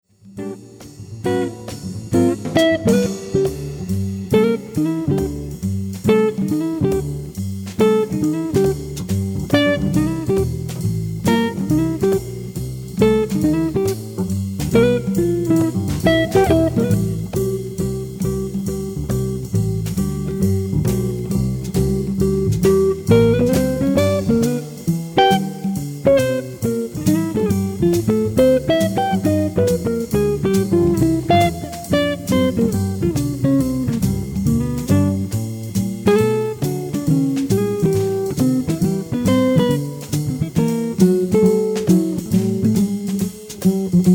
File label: octaves solo